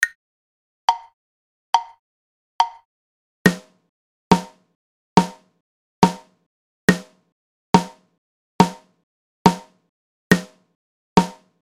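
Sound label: quarter note, drums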